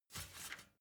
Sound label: step ladder metal soft single; schritte